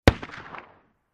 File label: 50 Cal Pistol Shot From Distant Point of View